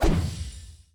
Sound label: whoosh